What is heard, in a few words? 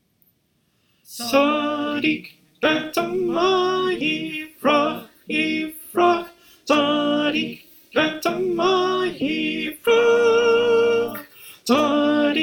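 Soprano, choir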